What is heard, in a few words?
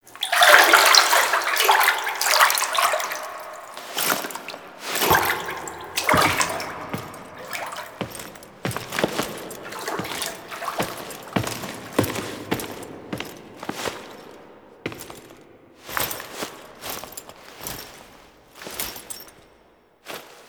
Foley; Warframe; Tau; Cinematics; LastBreath